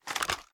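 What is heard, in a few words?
assault rifle reload fast rattle; gmod; viper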